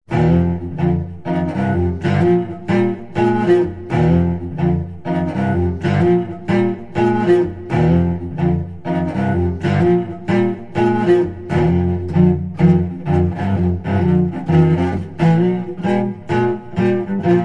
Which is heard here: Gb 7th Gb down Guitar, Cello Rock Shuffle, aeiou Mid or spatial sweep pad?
Cello Rock Shuffle